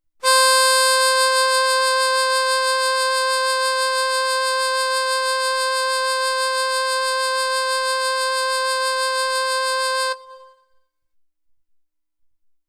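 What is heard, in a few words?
harmonica high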